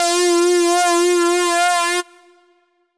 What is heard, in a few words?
lead simple F